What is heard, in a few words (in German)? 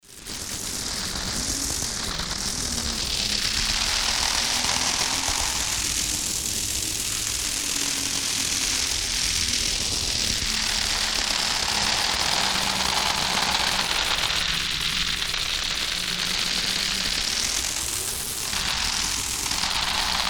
high energie